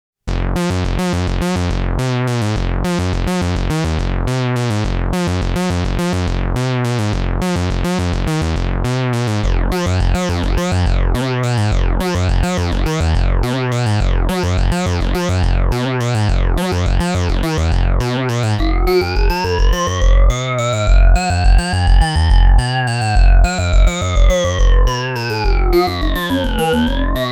KORG Vibrant - Elastic Bass

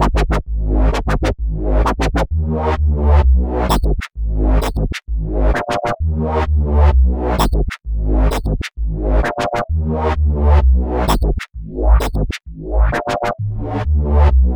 Sequential on Dubstep Bass

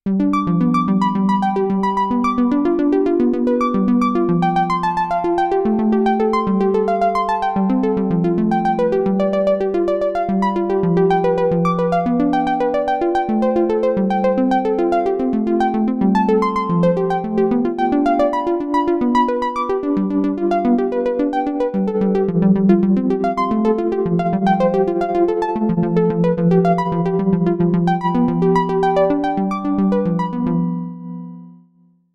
Korg Drift - Arpeggio

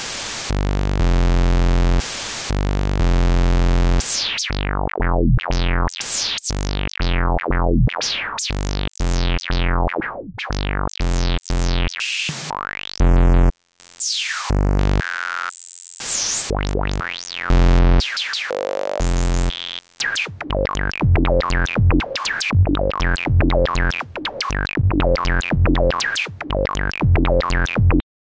Analog Waveforms; Cluster

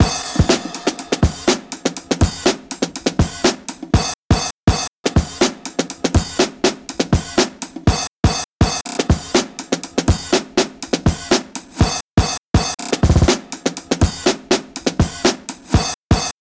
Acoustic Drums; Fraction

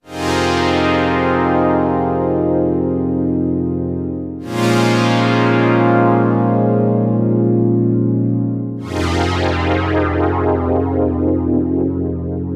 KORG Stator - Saw Fifth; prologue

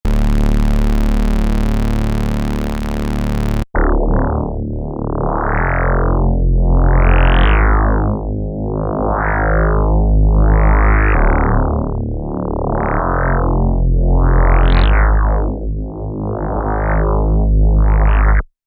Fat Synth; Turbo

